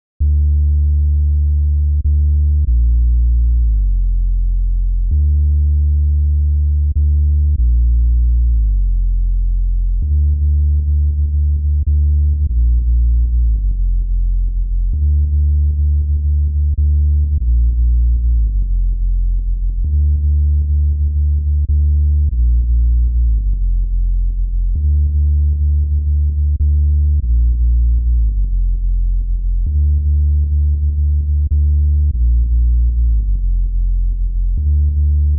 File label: instrumental Bass; Pony Music Archive